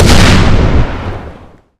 Explosion; Angry Goy II; SoundEffects

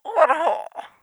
Death; Angry Goy II; Muslim

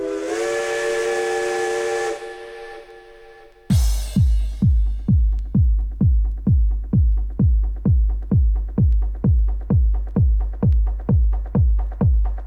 Ska Train

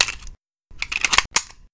Reload; Angry Goy II; SoundEffects

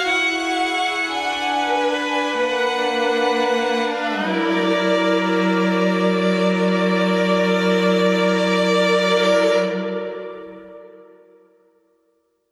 Death Song; Angry Goy II; SoundEffects

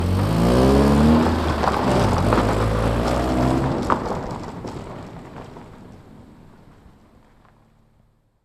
Drive Away; Challenger; Angry Goy II; SoundEffects

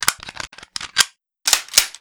Reload; Angry Goy II; SoundEffects

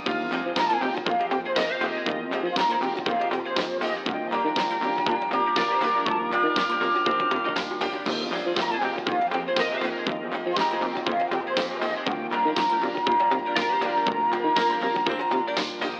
elevator music; Angry Goy II

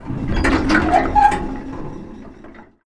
Elevator Door; Angry Goy II; SoundEffects

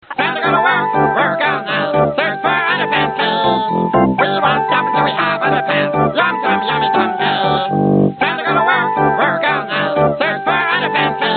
gnome song

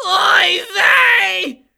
Death; Angry Goy II

